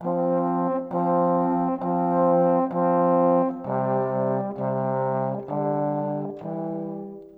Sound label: Horns, Orchestral; Sonic Foundry (Sony Creative Software) - Orchestral VOL-2; Modern 38